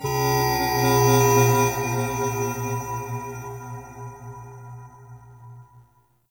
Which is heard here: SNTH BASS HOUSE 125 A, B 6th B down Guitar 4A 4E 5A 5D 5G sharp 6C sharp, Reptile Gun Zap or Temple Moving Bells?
Temple Moving Bells